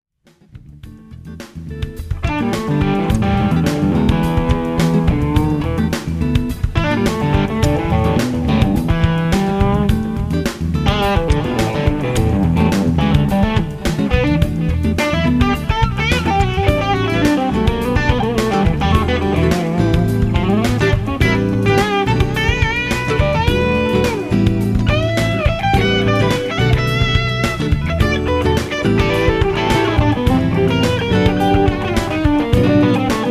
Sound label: crunch